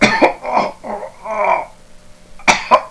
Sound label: choke